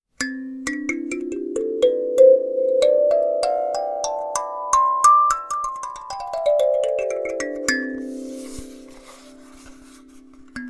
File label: Chromatic Treble, kalimba